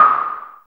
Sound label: JUNO NOIZ LO, JUNO PERC; Drums In Your FACE!